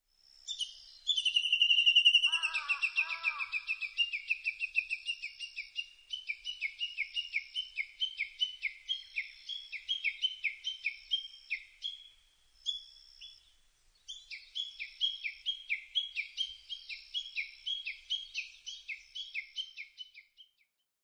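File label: Japanese bush warbler; uguisu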